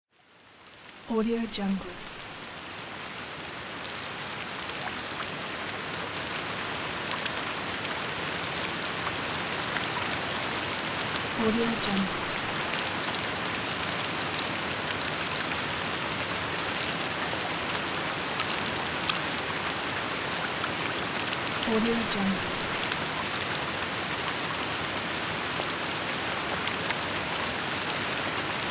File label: rain